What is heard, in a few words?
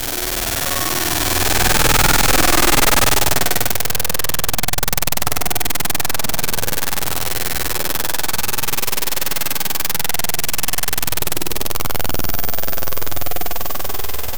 short digital, glitch